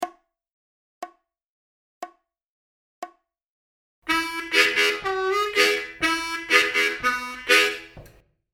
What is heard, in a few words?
Country Style Vamping Licks Lick 60BPM, harmonica